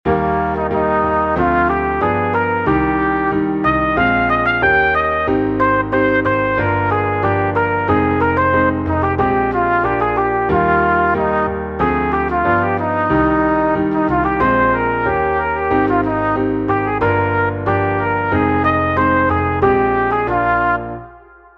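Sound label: melody no motive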